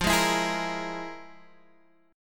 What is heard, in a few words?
F Minor Major 11th, F; FmM11 strummed; x,8,6,9,9,6